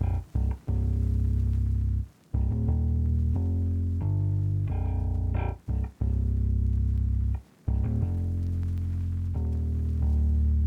Classic Melody Loop 90 BPM C# Min Bass; Melodics; Loops; Loop Stems; Cymatics; Eternity

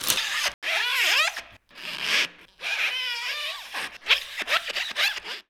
quiiik, Cartoon Film Effects

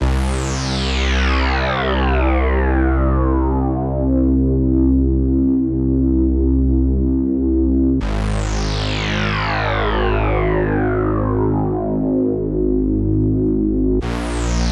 120 BPM C Min Bass; Melody Loops; Cymatics; ANALOG EVOLUTION Beta; Cyber Collection

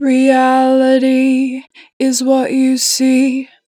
Natural Vibrato, Vocals, Reality, 2 bar vib; Harmor Vocals

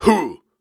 Grunts and Chants, Viking Vocal

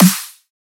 Dubstep Snare; Ghosthack; Dubstep Sound Arsenal

